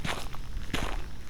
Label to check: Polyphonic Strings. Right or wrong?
Wrong